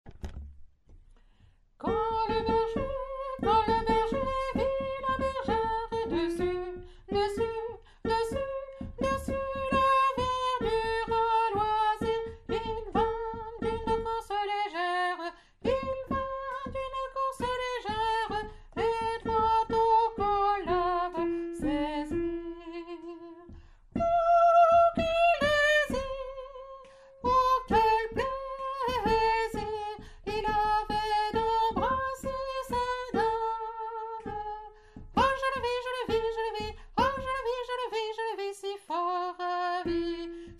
soprano, chorale

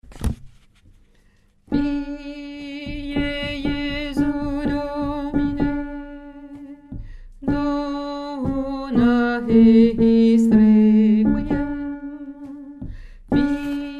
lettre C alto, chorale

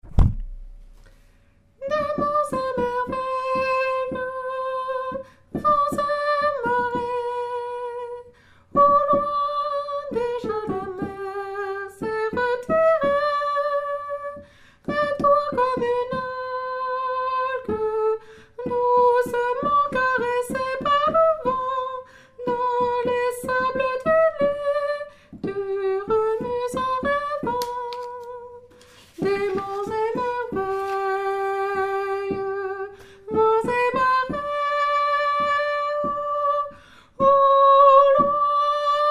ténor

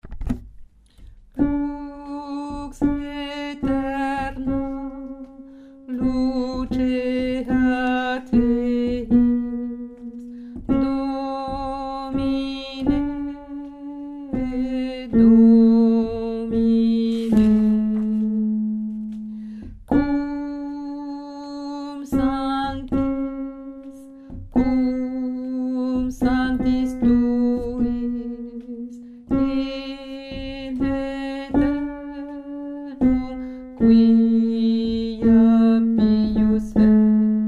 basse

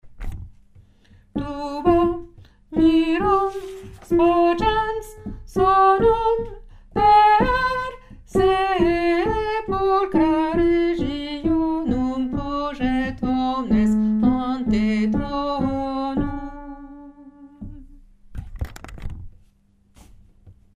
lettre D alto; chorale

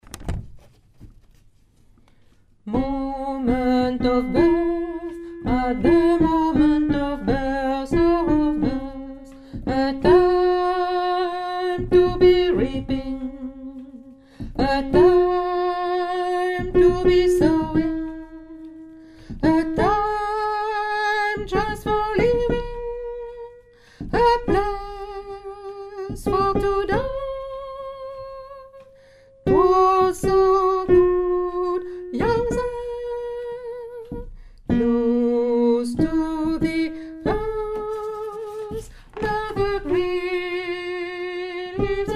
basse

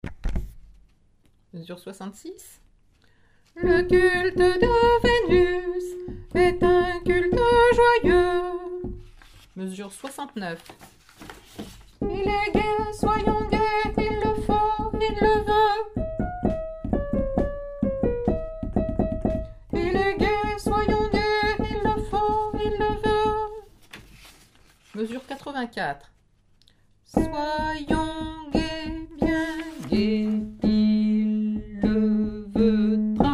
Paris basse

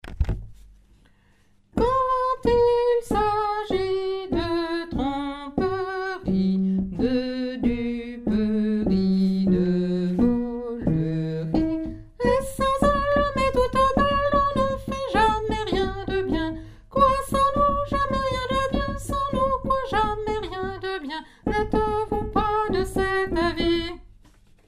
hommes, chorale

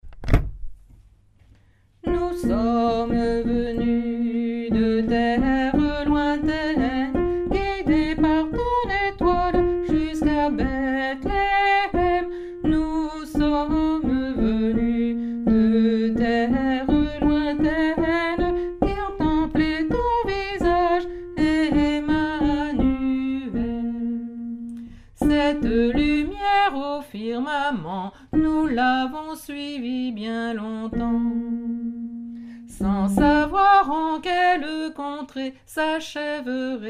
basse; epiphanie

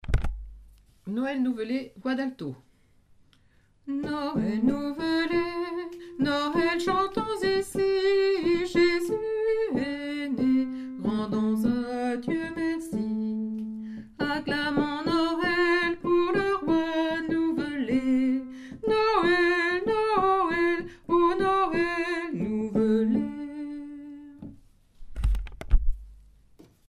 noel nouvelet alto

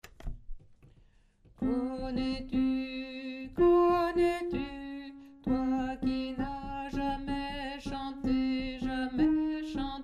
alto

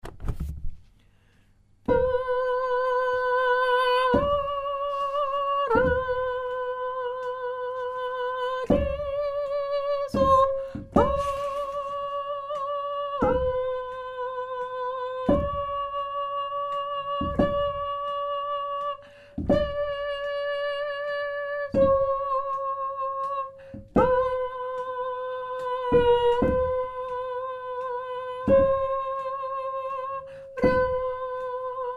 lettre F mezzo, chorale

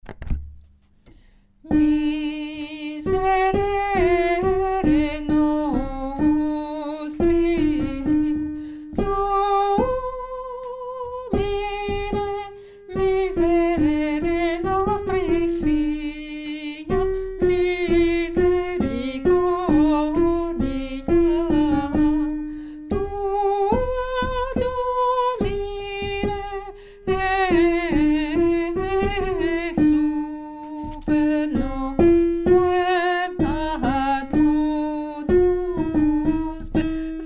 te deum alto lettre L

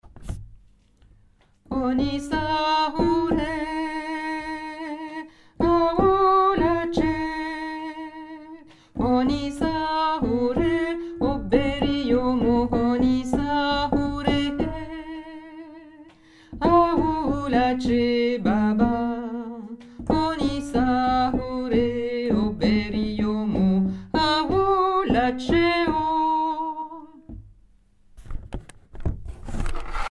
alto basse

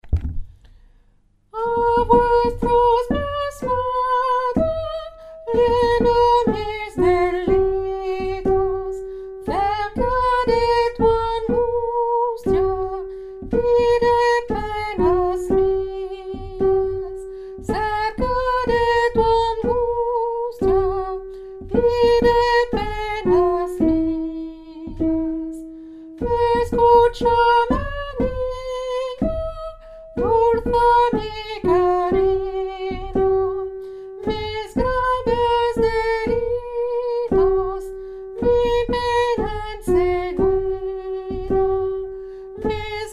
tenor, chorale

